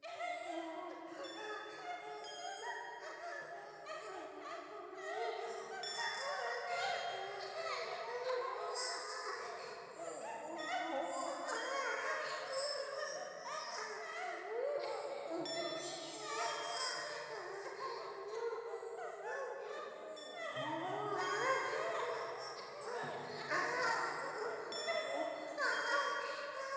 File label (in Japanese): 喘ぎ 寺